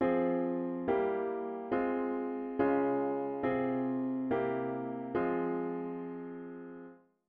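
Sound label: diatonic inverted V7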